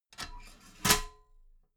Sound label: metal ladder small handle fold regular